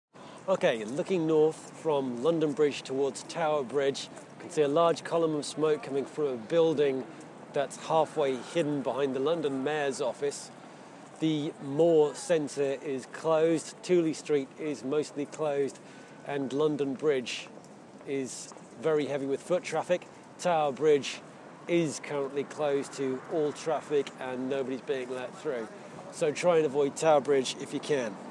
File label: fire; just south of tower bridge